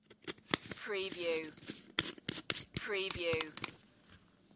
Screw plastic cap on tin or jar

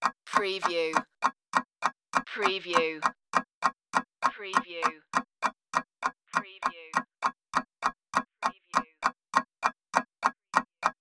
clock ticking

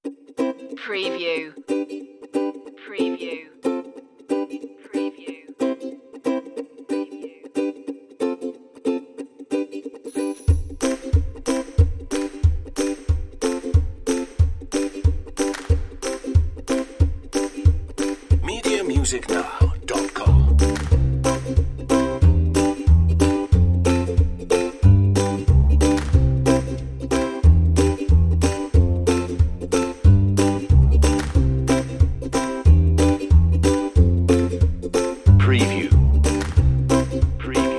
reggae happy underscore

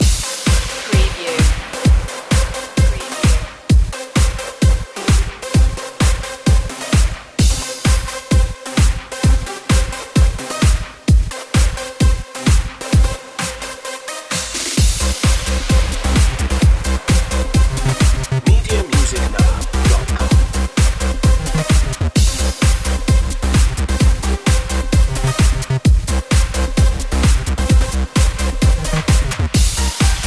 party rave anthem

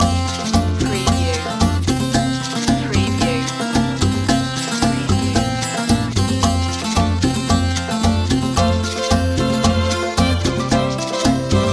groove

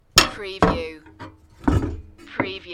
Metal Tl Bang Wd

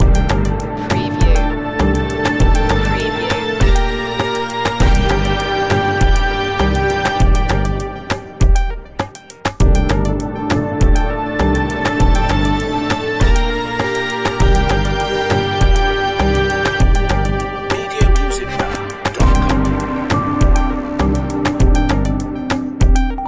the clockwork loop

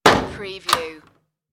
light room door close

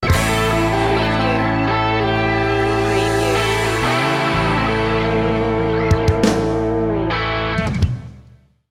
sting

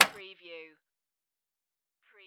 Pinball sound, clank

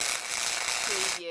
Arc Welder

